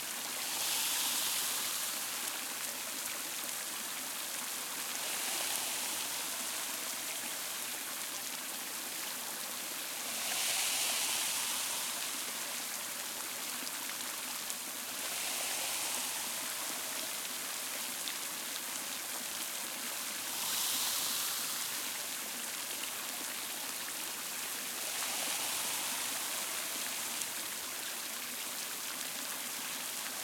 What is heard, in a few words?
Ambience Fountain; Ambience Fizzle Fountain